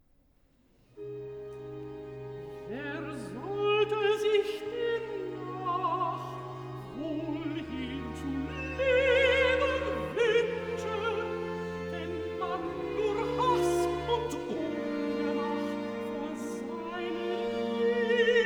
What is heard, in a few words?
Recitativo (Alt), BACH for ALTO; Wer solite sich demnach